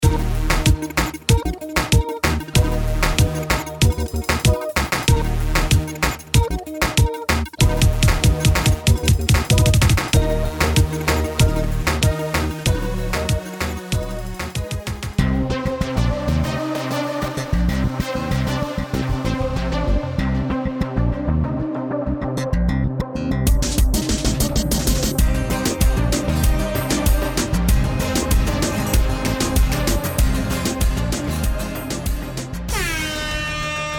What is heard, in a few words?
Reggaeton; Revolucion